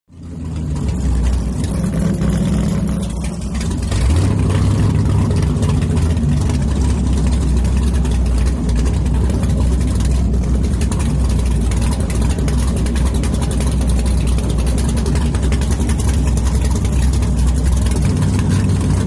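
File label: Power Boat Interior Idle, off shore power boat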